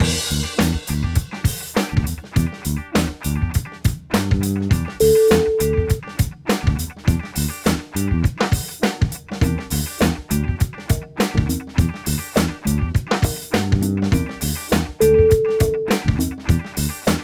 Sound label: funk town